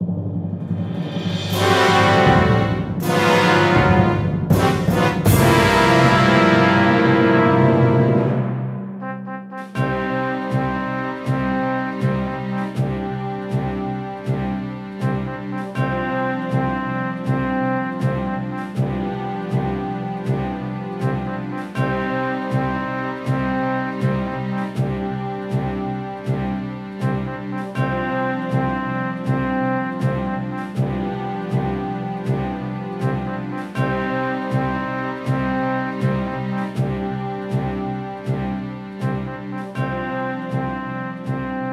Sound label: Fail; steel diver sub wars; 3ds